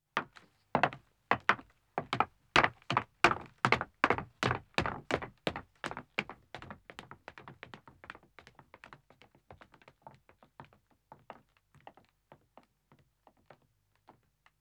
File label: Wooden Stairs Descending, 2 Me, Footsteps; BBC Sound Effects Library; Footsteps II